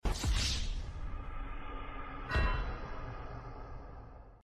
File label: wasted